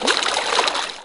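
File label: WATER SWISH, percussive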